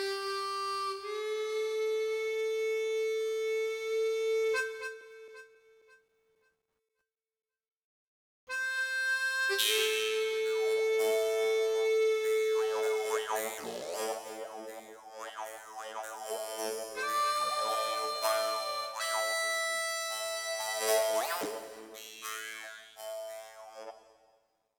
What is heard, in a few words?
Tumble Weed; Plants vs. Zombies Battle for Neighborville